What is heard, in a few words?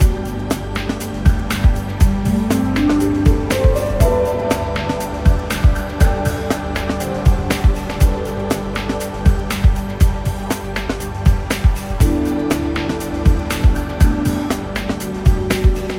BGM TOP; sengoku collection by konami android game music